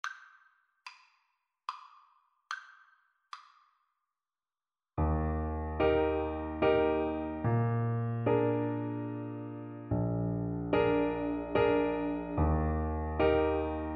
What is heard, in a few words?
Baritone Saxophone